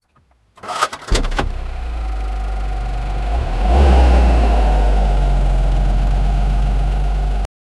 car stopping engine cuts; brake

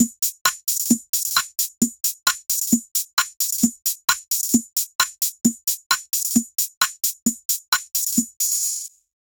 full drum loop in the, 132 bpm; SFX Engine

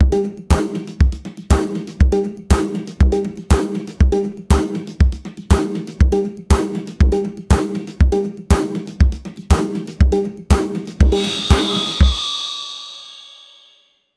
ethiopian cultural music; world music

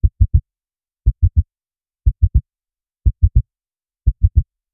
heartbeat begins faintly; rising tension

